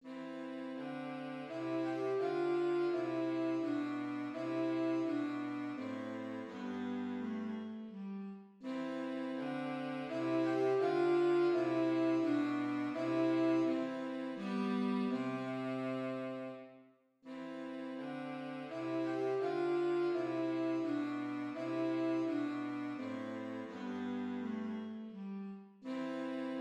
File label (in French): menuet majeur; bach johann christian